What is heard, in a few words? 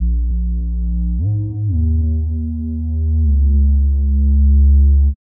D&B Bass Loop; neuro